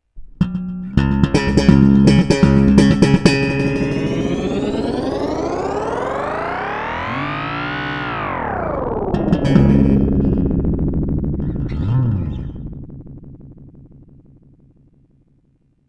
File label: dd20 twist